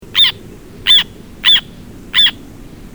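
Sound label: repeating cry of tern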